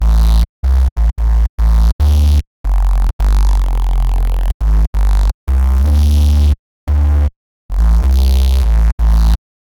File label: growl bass synth; system